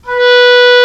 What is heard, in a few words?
Oboe mf B3; Digital Sound Factory Virtuoso